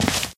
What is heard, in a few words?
white tile, footsteps